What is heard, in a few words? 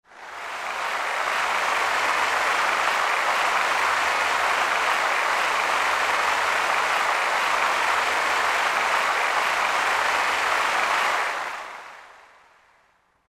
Applause, Roland JV-2080